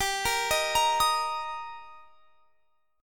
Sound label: G Minor Sharp 5th G; Gm#5 arpeggiated; x,22,20,20,23,23